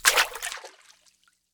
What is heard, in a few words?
Water Walk; moul